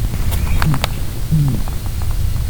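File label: Clapper rail hoo; National Marsh Bird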